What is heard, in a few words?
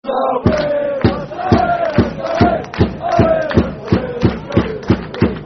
Haway The Lads, Football Chant (Soccer Songs); Darlington Dfc